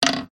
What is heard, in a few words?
ball bounce